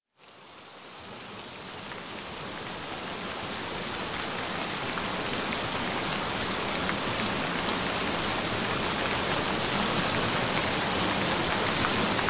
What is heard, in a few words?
Gentle Rain Sounds Frequency-free; Hyperhidrosis Treatment; Stop Sweating Forever